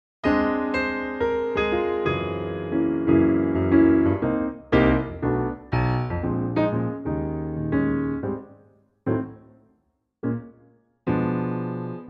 Ab piano; swinging piano only arrangement; key - Ab - vocal range - C to Eb

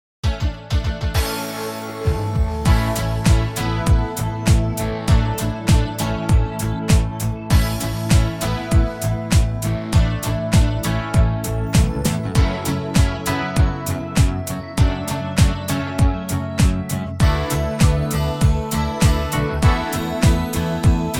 A, female backing track; vocal range - A to A (optional C# & B top note)

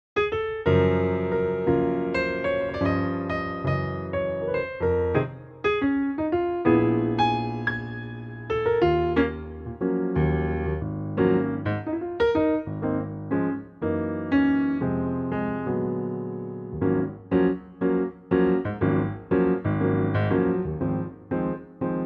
piano

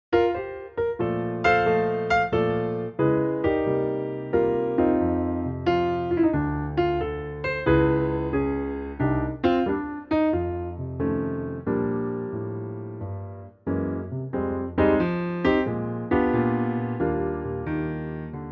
Bb piano; key - Bb - vocal range - D to D; Lovely piano only arrangement of this great old standard, at a great tempo and feel.

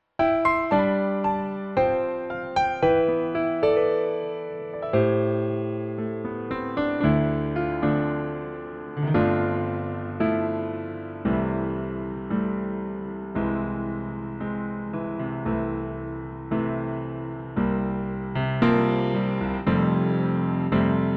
C piano

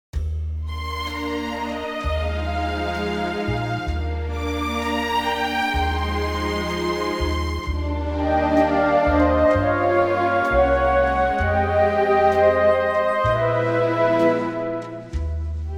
Eb orchestral; key - Eb - vocal range - A to D

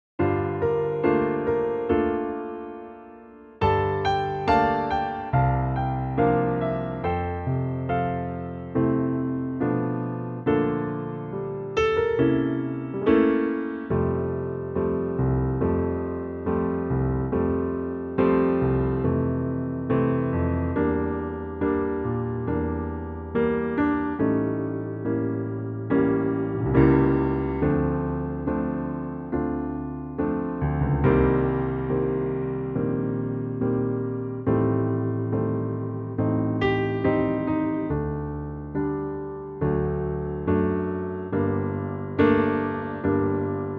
F to Gb piano; key - F to Gb - vocal range - D to Eb

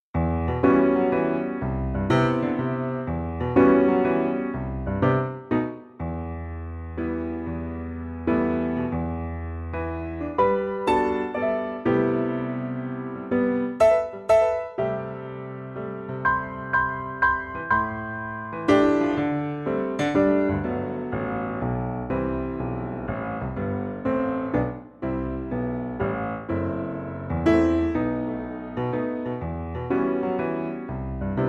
piano

